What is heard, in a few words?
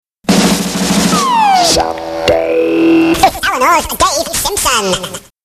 Jingles